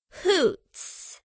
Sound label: hoots